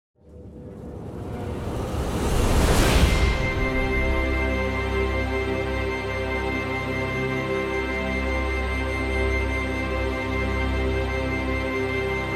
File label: Epic Brass; Epic Orchestral; StudioLucid